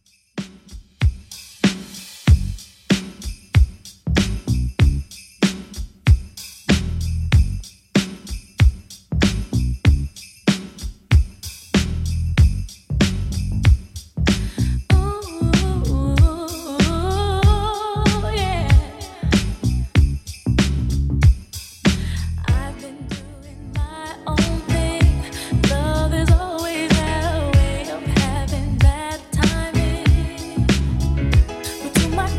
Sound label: 90s Redrum 95bpm